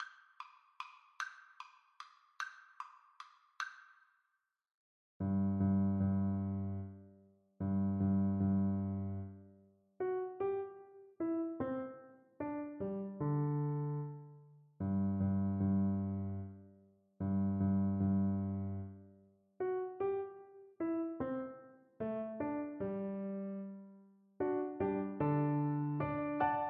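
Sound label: viola karaoke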